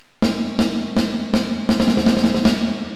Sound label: Level 0.0 dB Filter 1600 Hz; Reverberate3; SIM TS